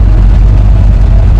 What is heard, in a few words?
cord; Mafie 1